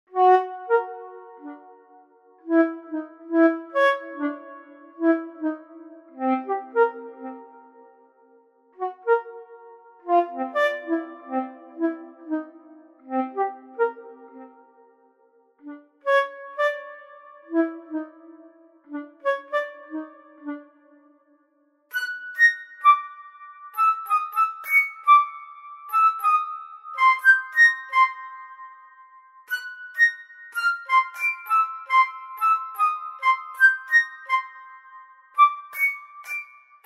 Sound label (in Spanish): Flauta